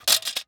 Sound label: Technology Electronic CD Case Open Fall To Ground